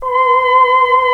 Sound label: CHR 2, CHAMBERLIN; Greytsounds